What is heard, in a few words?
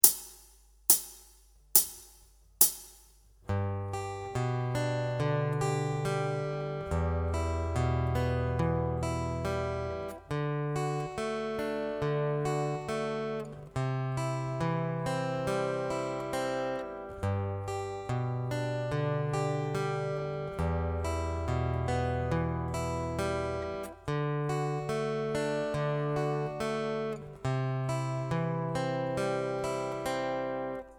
Chord Pick Pattern